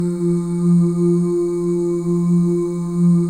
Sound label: BGV OO